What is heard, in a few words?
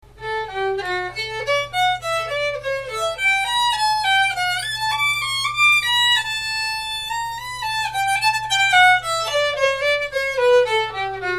menuetto, violin; adult violin